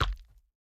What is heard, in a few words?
Bounce Bone Big